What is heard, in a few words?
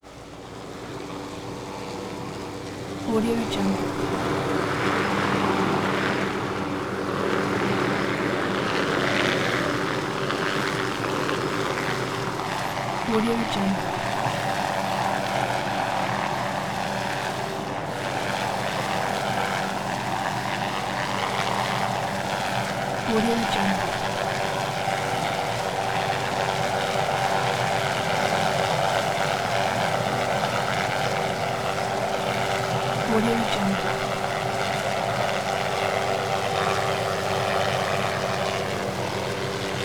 hughes 500 helicopter hovering maneuvering; prosoundfx